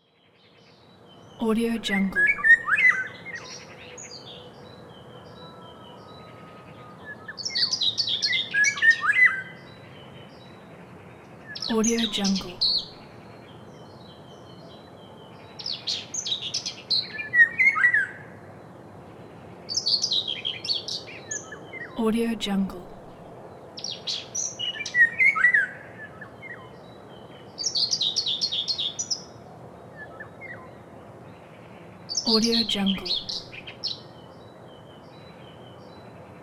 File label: singing birds